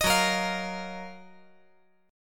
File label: F sharp Major 13th; strummed; JGuitar